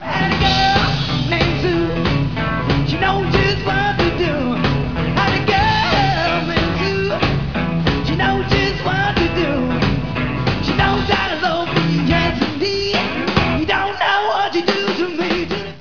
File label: tutti; During their 1994 touring season, the band recorded many of their shows direct to DAT; reduced to 8-bit 11 khz mono